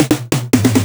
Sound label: Electro Fills